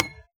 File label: Moody Park Playground Perc; Houston; percussion